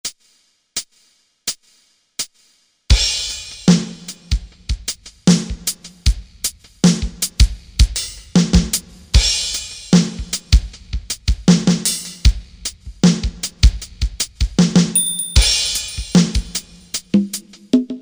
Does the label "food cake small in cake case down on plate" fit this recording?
No